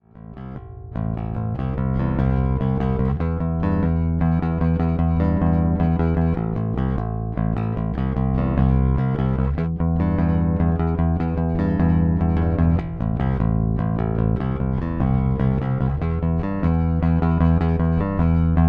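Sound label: Sadowsky NYC Jazz Neck Pickup passiv, bass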